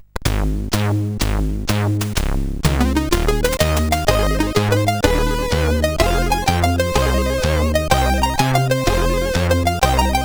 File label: Jazz, sid CSG8580R5